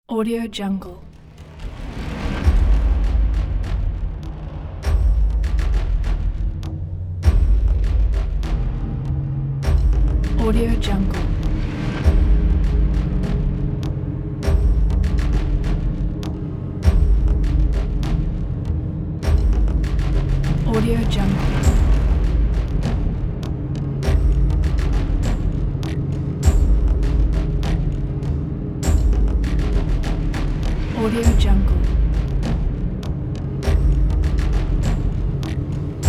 loading jungle percussion

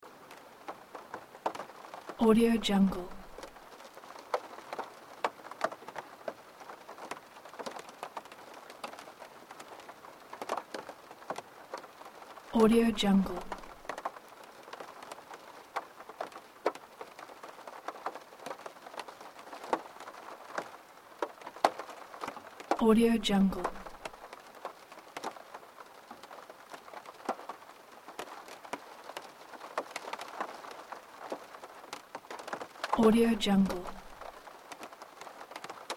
rain on window

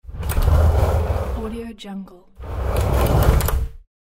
sliding door sound

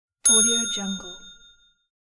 game show bell

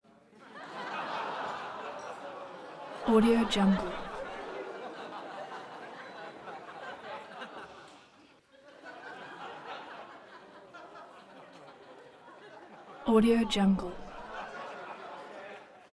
crowd laughter reaction comedy audience chuckle

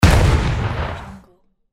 shotgun

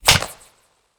ambient game pickup item wooden power rune; esmteam

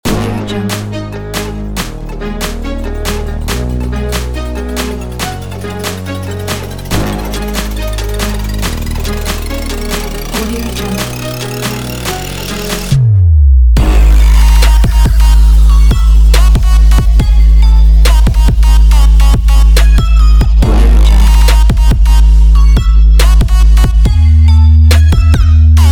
workout

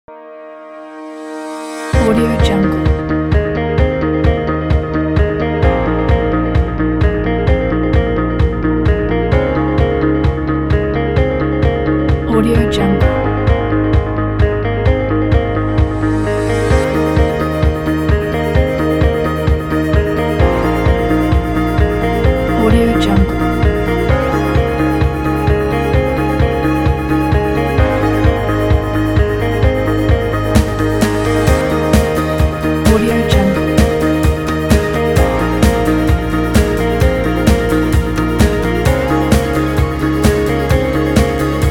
motivate

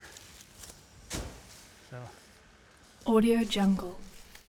int bale toss; collanderson

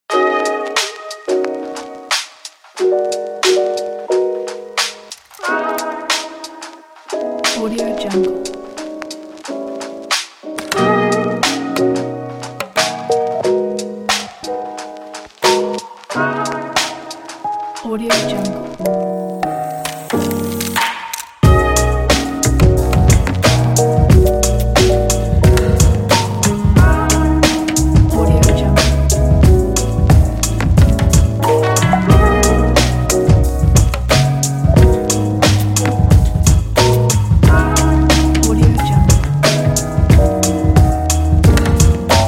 lofi smooth beat

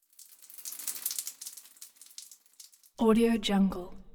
waterflow; soundbits-soundfx

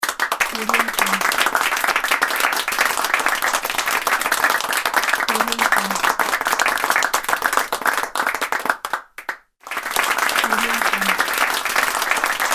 applause

